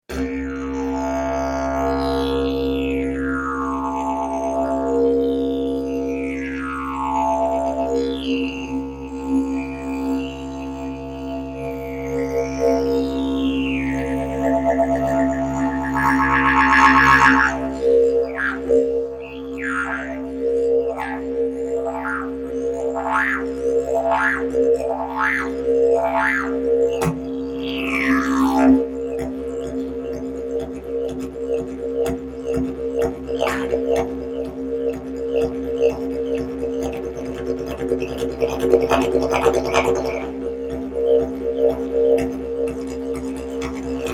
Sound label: didgeridoo